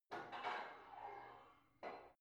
barbell heavy plate put on soft reverby, fitnessstudio; sport freizeit